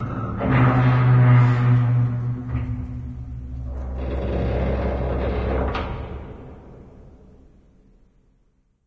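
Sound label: metal randoms impact, ambience; source engine; portal2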